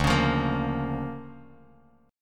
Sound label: D Minor Major 7th Sharp 5th down Bass Standard, chord; DmM7#5 strummed; JGuitar